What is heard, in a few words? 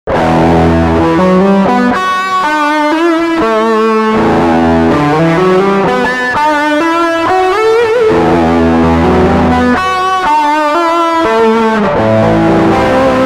Preset Straight up Lead